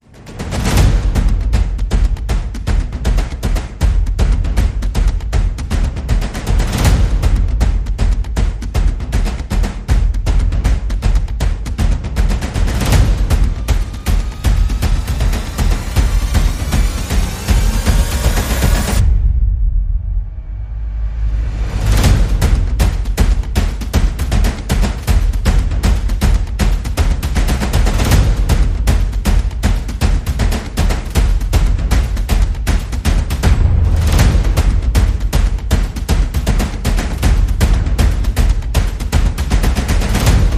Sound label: Percussion and Effects Only